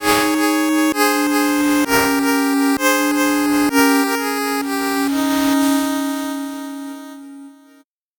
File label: 8bit jingle slow